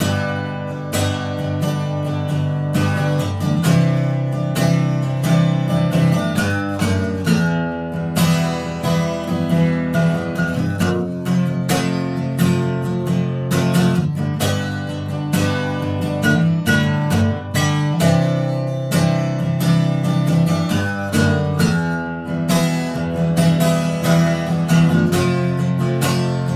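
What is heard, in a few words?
subway play for tips playlist guitar; The Sims 3; Windows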